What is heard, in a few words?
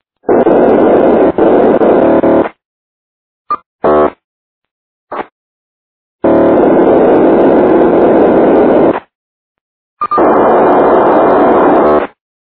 153,35MHz; Scanners